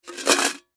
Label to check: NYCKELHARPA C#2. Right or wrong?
Wrong